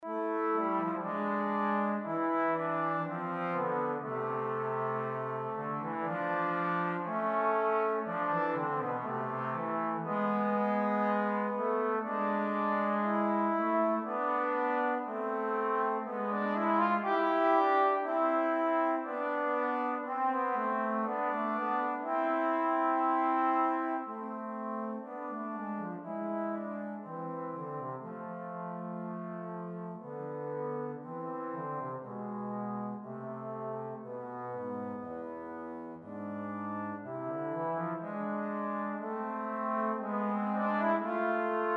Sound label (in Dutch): Duetten voor trombone; Duet 2